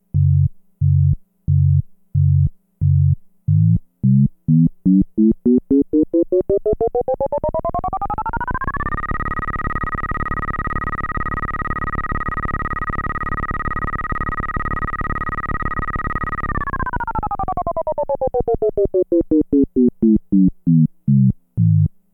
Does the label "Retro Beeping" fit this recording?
Yes